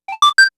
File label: Alert; Samsung; SGH-i300